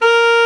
ALTO SAX A#4; Greytsounds VOL-1

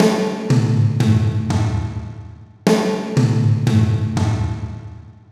Power Drum Set Fill, processed; Sonic Foundry (Sony Creative Software)

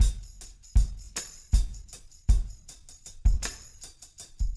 6/8 tam; Big Fish Audio - DOM

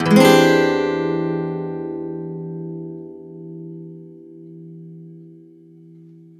E 7 #5 downstroke, Acoustic Guitar; Sonic Foundry (Sony Creative Software); Songwriter Acoustic Guitar Companion; One Shot Chords

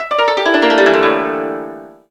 Koto Down; SmallKoto; Ethnic

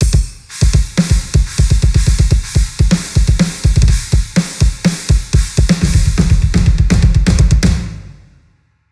Acoustic Drum Fill

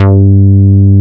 JUNO E-BS G3; Drums In Your FACE!

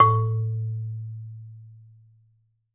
SOFT A1, Marimba; Sampleheads; Dave Samuels Marimba & Vibes

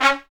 BRS TP, Roland, Stabs; Roland L-CDX-03

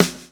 lash, backbeat

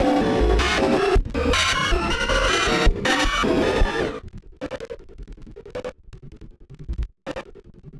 Battered Synth; malfunctioning synth samples

